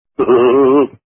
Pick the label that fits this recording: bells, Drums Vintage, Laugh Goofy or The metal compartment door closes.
Laugh Goofy